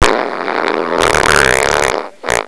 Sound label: fart